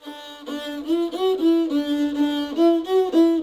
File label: 105 bpm A